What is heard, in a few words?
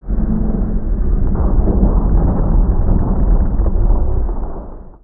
Atmos; In the Line of Duty Firefighter